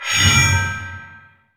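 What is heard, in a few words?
PER PROCU, Procussion, percussion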